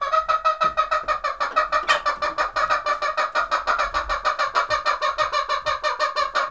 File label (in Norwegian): hone